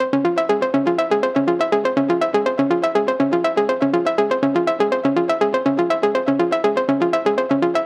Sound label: 122 BPM Arps C maj A min Tonic C; arp essentials